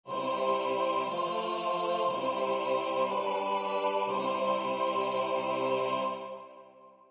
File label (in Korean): 주3화음 전체